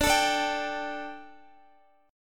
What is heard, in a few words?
D Suspended 4th D, Guitar; Dsus4; strummed; x,x,12,14,14,14